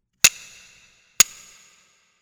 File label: kobyoushi